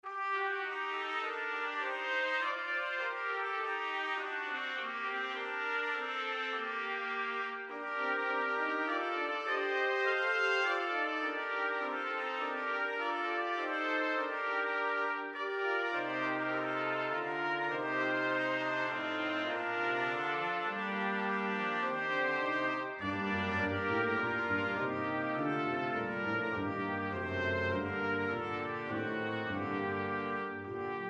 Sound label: Brass Quintet; Liedboek; Hoor aan gij die Gods kinderen zijt; kwintetten